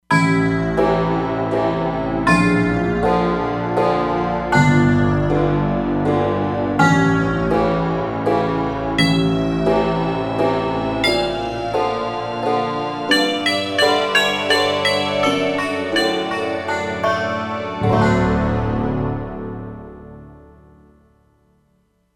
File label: Hybrid Pluck, E-mu Proteus-3; Factory patches